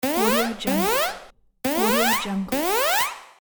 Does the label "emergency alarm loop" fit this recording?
Yes